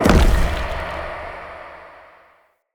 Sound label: Dodge Trap Spawn; Enemy; Abilities; Characters; Circade